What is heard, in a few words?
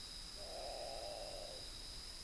Great Potoo